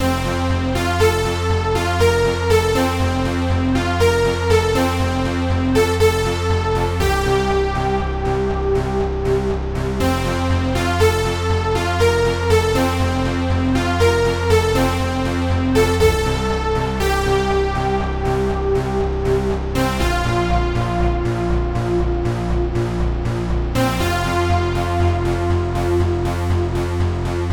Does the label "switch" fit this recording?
No